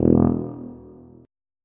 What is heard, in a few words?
tuba note